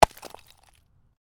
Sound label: Ice: medium chunk of ice lands on hard surface; Snow; Stuart Duffield